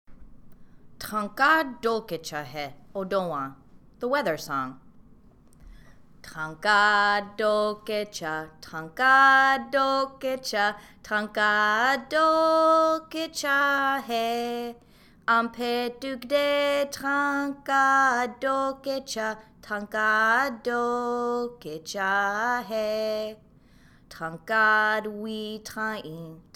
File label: weather song; Dakota Weather